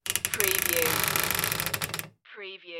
creaky wooden closet door

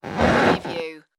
creaky floor

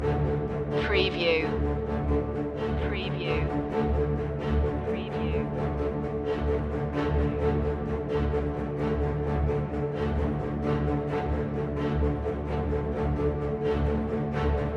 epic medieval tale loop

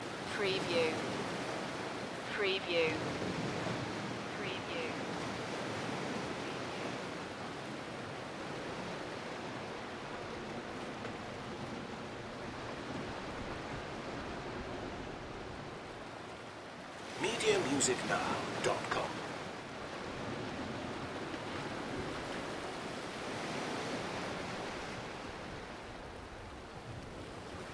Ocean Surf Loop

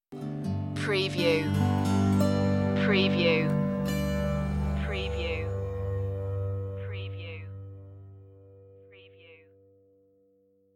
rageshri prelude stinger

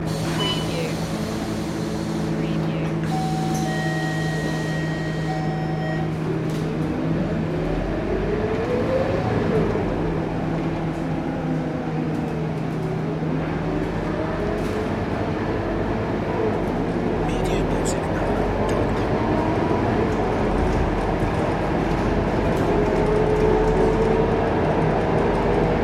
bus ride singapore loop

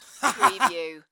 Laugh Male, Laughter, Laughing Male

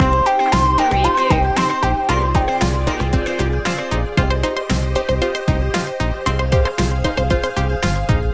alarming news loop; news music suitable for tv news bulletins, current affairs, breaking news

